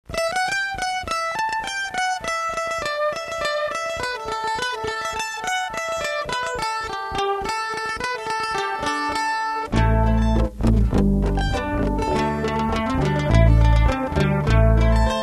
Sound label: mandolin